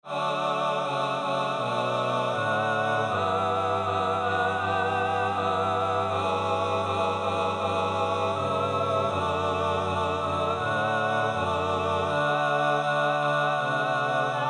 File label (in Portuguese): coro masculino ttbb